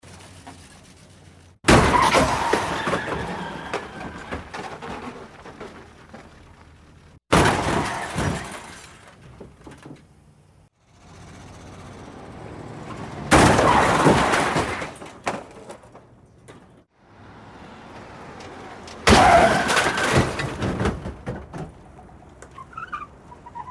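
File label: 5 variants of car collisions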